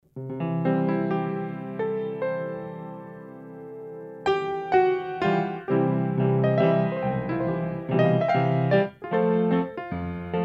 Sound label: instrumental; calvaryministries